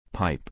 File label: pipe; Saint Patrick